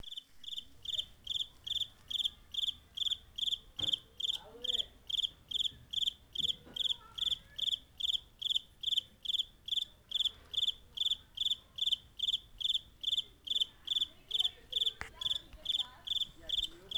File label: post office cricket; crickets